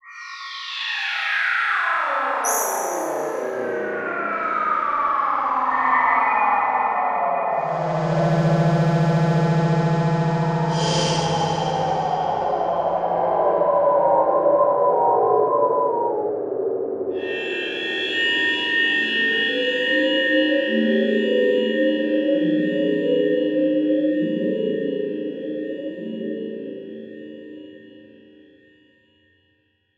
Electronic Whoosh Reverse Feedback Digital; Production Element Title Imaging

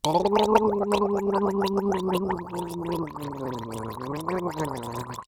Human Male Gargling

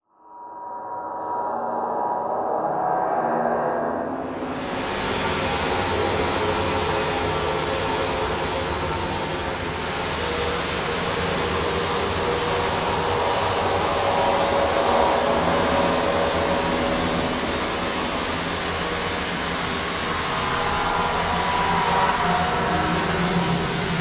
Slow Phasey; Module Production Element Title Imaging; Imaging Elements

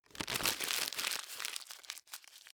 Household Paper Crumble Notebook Paper

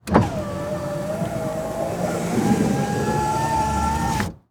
Truck Ford Ranger Roll Up Window Exterior; transportation

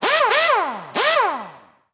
Industry Tool Air Gun Run Multiple

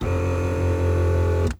Auto Nissan Altima Seat Move Back A Little; Transportation

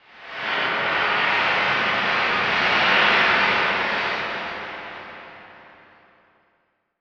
Title Transition Glass Element; Production Element

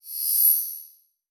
Flash Whoosh; Multimedia Internet CD-Rom Flash

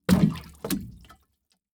Liquid Water Water Jug Hit Surface Of Water

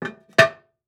Cookie Jar Replace Lid Ceramic; household

